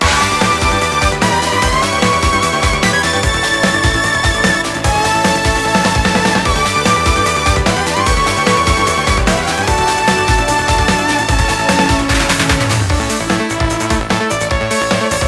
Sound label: Battle Ship; Neo Kobe City